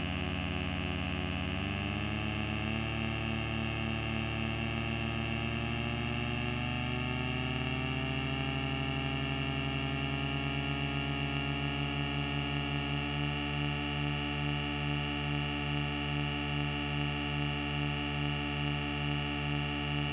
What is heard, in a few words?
harmonic drone build up, weird sound generator samples